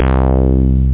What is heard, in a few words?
new bass